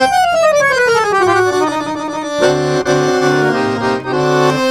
Balkanish Traditions; USB Soundscan vol.63; AKAI

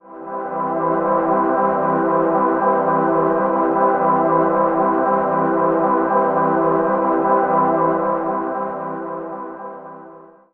Major 7 Mist C, Ambient Pads; Zero-G Seismic Frequencies; 90 s Sample CDs

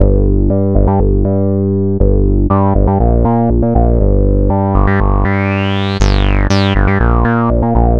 Pro Bass, Bass Foundations; Sonic Foundry (Sony Creative Software); The Electronic Music Manuscript Collection

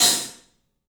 club hat; 3D Sound; 3D Drums

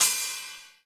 CRASH, Hip Hop Misc Perc; E-MU Producer Series Vol. 8 – Platinum Phatt

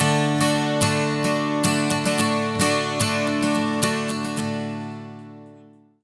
110 MAJ 12 STR, Acoustic Guitar Loops

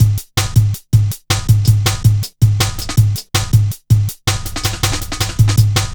Drum Loops, Jungle

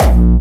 Distortion Kicks, Bassdrums

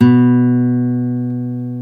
GTR Nylon, Roland; 90's Sample CDs; Club-50 - Foundations Roland; Nylon Martin; Nylon 1

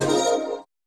perc, Roland MC-909

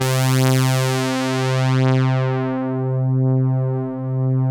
SYN EUROSY; AMG - Now CD-ROM (Roland); Megabass 1

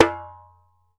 ASHIKO, African Perc

